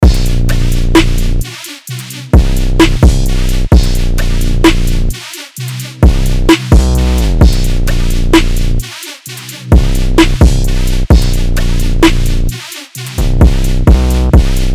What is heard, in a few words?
130 BPM Bbm; UNISON DEMO; Beema